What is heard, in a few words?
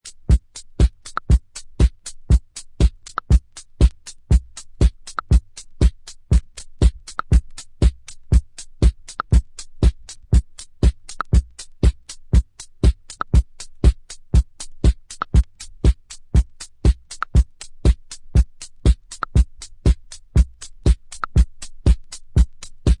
drums